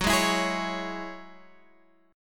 F sharp 7th Suspended 2nd, Guitar, Open G; 16,13,14,13,14,x

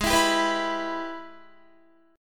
Ab Minor Major 7th Sharp 5th, down, Guitar; strummed; AbmM7#5

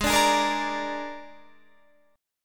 Ab Suspended 2nd Flat 5th Ab, Guitar Standard; 16,17,18,15,15,18; strummed; JGuitar

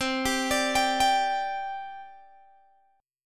C Suspended 2nd C Guitar Open G; down; chord shape

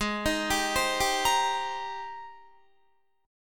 Ab Major 9th Ab down Guitar 4E 4A 5D 5G 5C 6F; AbM9; arpeggiated

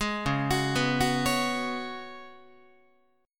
C sharp 7th Suspended 4th C sharp, Guitar; C#7sus4; arpeggiated; tuning=4A,4E,5A,5D,5G#,6C#; guitar chord

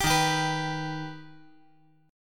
Eb Augmented Eb; strummed; JGuitar

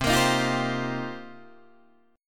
C Minor 11th C Guitar DADGAD; Cm11 strummed

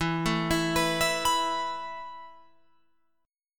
E Suspended 4th E, down, Guitar, Open F; Esus4; arpeggiated

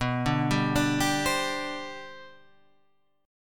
G Major Add 11th, B, Gadd11/B chord; arpeggiated; JGuitar

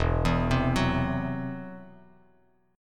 E Suspended 2nd Sharp 5th E down Bass Standard; Esus2#5 arpeggiated

